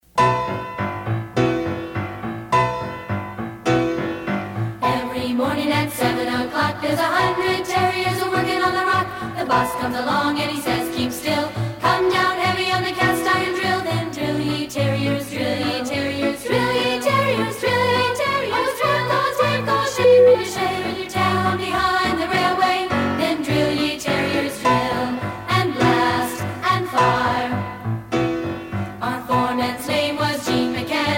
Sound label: drill ye tarriers, drill